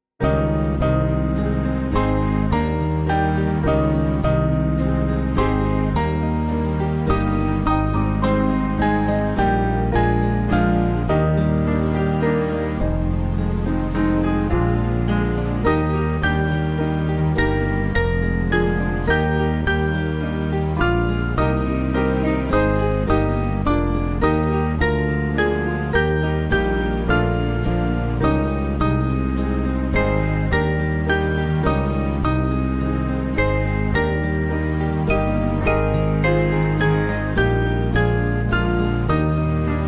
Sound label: Band; In Memory; Salzburg